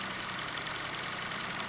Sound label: idle, weapons; tfa cso; turbulent 1